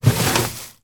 household refridgerator compartment drawer pull out open; sound effects one